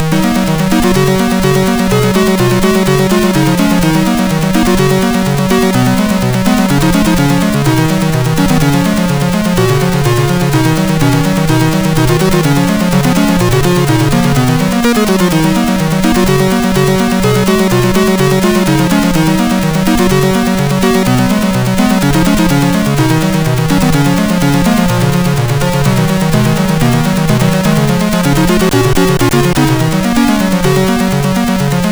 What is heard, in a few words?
Short Piano Theme sid MOS6581R4